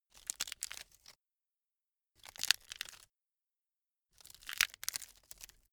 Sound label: bamboo crack single